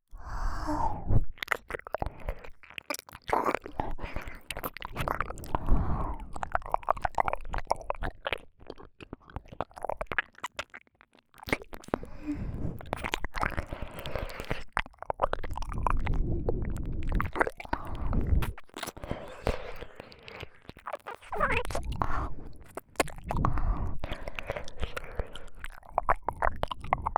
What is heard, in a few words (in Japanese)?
ぐっぽり 耳舐め; ユイ